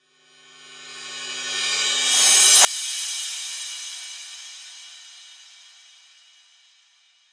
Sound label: EDM Reverse Crash